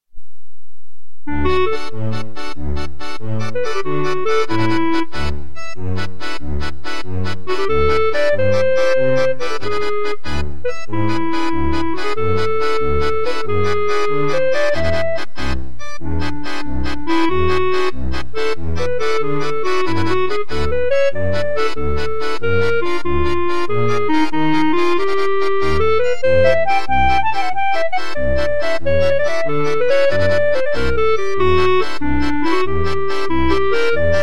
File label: waltz, a quirky waltz; impro; courtesy of midichord, using this muscript